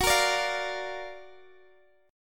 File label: Gb Augmented Major 7th, down, Guitar, Half Step Down; Listen to Gb+M7 strummed